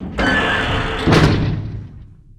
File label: Door Metal Close